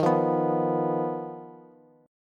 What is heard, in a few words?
Eb Suspended 2nd Sharp 5th, Bass; Ebsus2#5 strummed; JGuitar